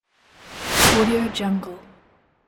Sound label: cartoon swipe